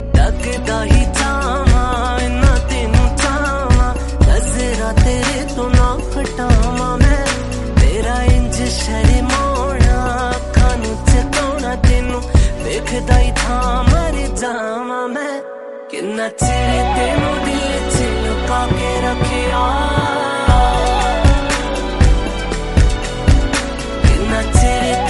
Punjabi Song Ringtone

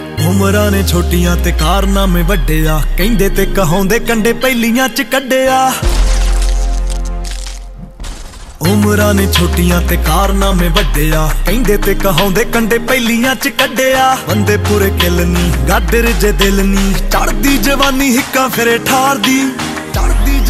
Punjabi Song Ringtone

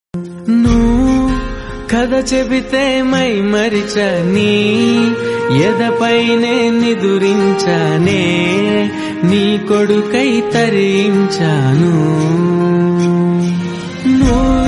Fathers Day Special Telugu Ringtone

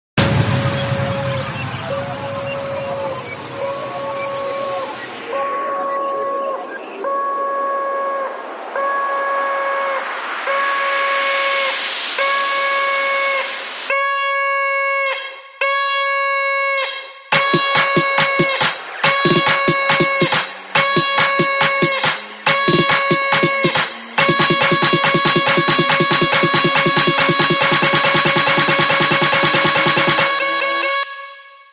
Baja Sound; 14 August

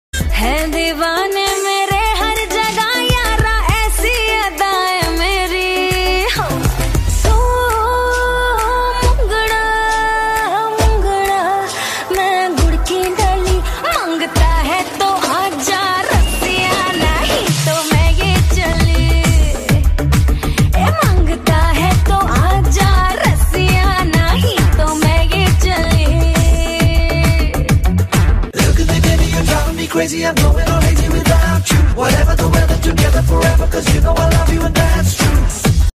Song Ringtone; Total Dhamaal

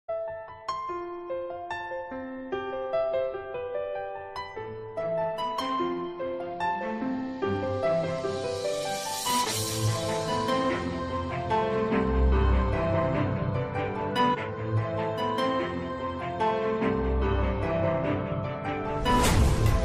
Latest Music

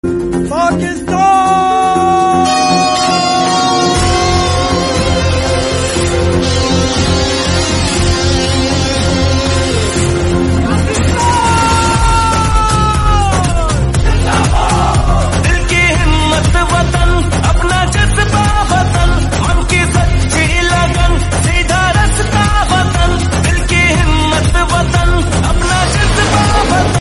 Pakistan Independence Day Song Ringtone

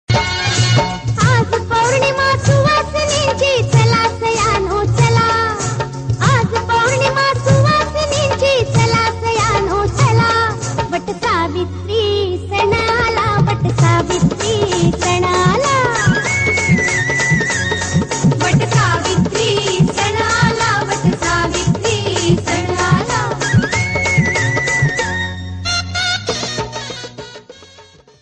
Marathi Ringtone

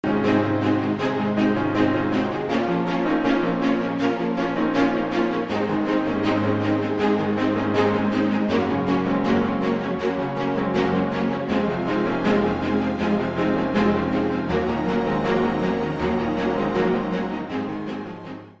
Lenovo Original Ringtones

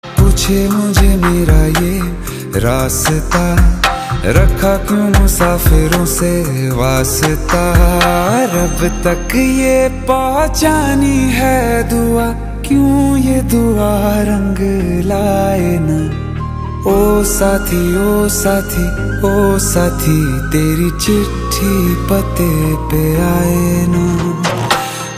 Sad Ringtone

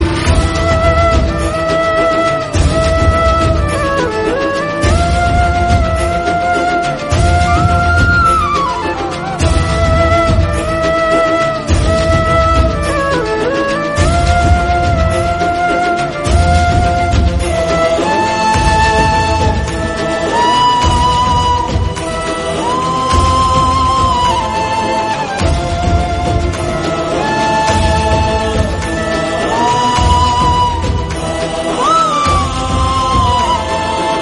Flute Best Ringtone; Radha Krishna

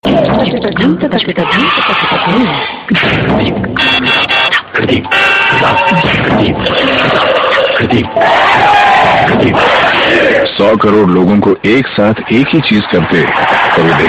Indian Tone; Ipl

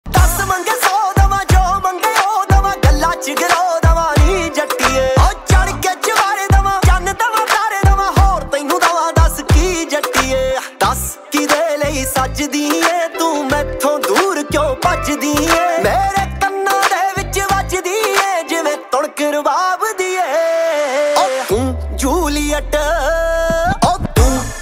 Song Ringtone

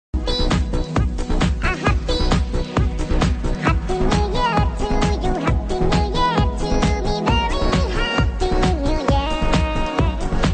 Happy New Year Background music ringtone

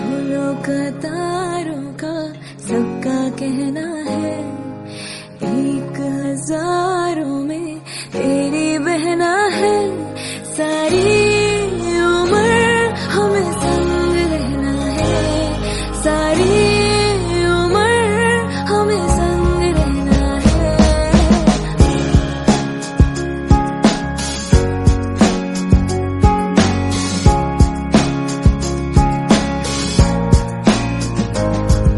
Female Ringtone

